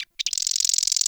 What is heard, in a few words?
Forest Bird Squak; Ambience; Warframe; Grineer